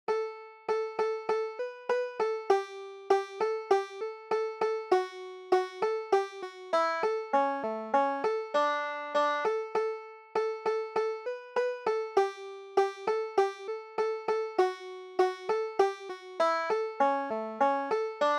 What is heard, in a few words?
old molly hare, banjo